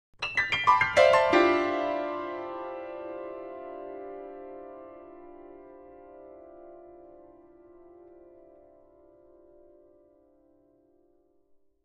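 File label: Grand Piano Descending Chords; keyboards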